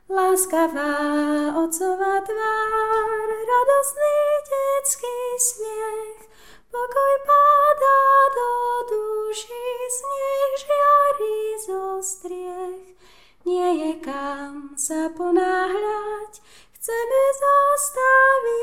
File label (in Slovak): sopran